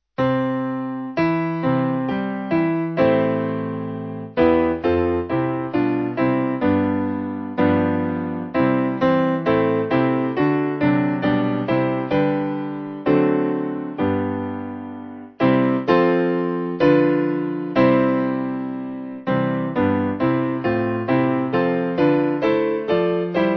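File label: Join All The Glorious, Piano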